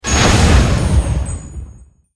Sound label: spell heal; magician